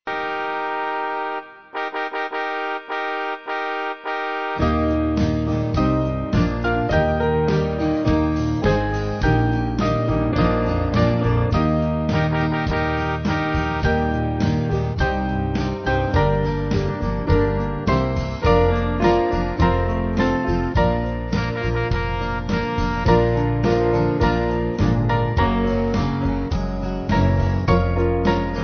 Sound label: Band Slow